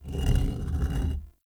Shrine Puzzle Stag Turn; Doors

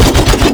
Spin Attack Loop; Warframe; Veilbreaker; Sprag

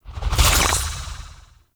Fluid Sac Tumor Trail Swallow; Encounters

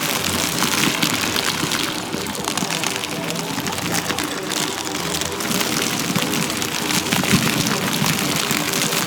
Frost Snow Globe Loop; Warframe

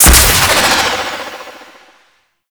Boost Thruster; Warframe; Archwing

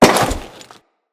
Crewman Step Default, Footsteps; Enemies; Corpus

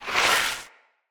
Corrupted Battle Dog Skid; Foley

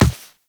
Walk Default, footsteps; Warframe; Characters; Operator

